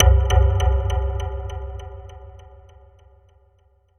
Springy Thud

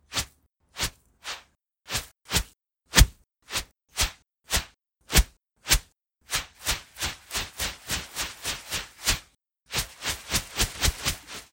Woosh Collection

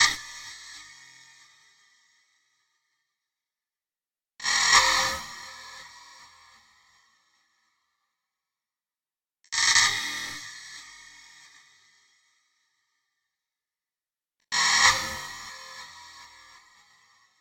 Alien Clock Ambient Space Sound

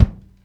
Bass drum

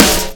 Combo Snare

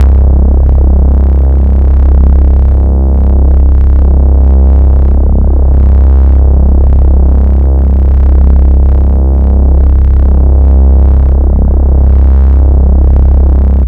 Nasty Mono Poly

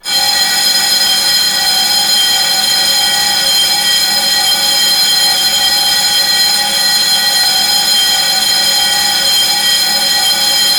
Stereo Bell Sound

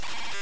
Glitch error

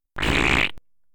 Fart

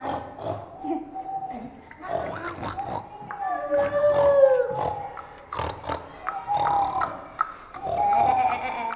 Animals

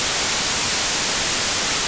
White noise

